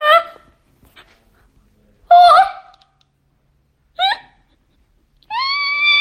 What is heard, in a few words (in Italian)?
risata